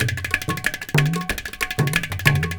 INDIA L; Spectrasonics - Heart of Asia CD2

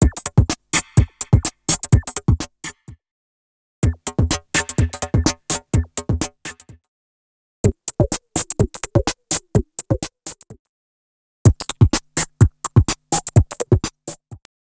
Electro Pool 126bpm; X-Static Goldmine 5

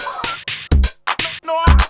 lop 126bpm, House Instr and Stab Loops

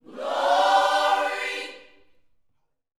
Vocal, Gospel, PHRASE; Spectrasonics

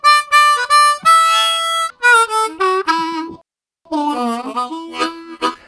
Highlo, Harmonica Riffs; Zero G - Harmonica

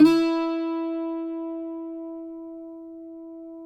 CUATRO; Spectrasonics; Hans Zimmer Guitars VOL-2

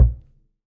Prepared Drums

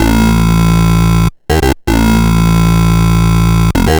Sh09 Bass Loop, 120BPM

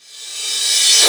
Reverse Cym, Cymbals

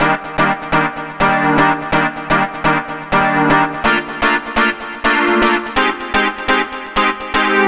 SYN 125, House synth loops

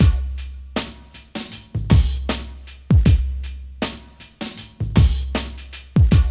GROOVE 78.00, Hip Hop; E-MU Formula 4000 Series Vol. 1; Hip Hop Nation; Guit It On 78.5

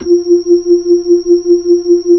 B-3, Hollow; Keyboards of The 60's and 70's

